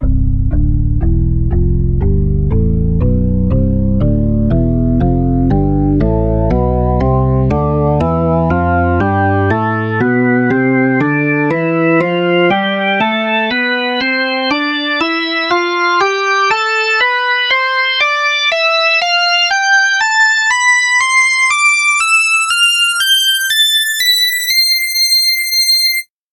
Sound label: rock organ; tonleiter